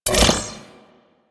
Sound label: Droid Array Unequip, weapons; Warframe; Cephalon